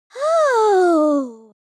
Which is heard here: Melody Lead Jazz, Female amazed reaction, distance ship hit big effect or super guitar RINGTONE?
Female amazed reaction